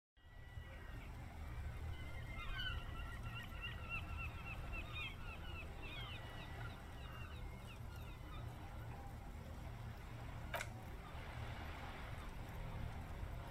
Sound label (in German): moewn und rauschen ambient sound sample; disco elysium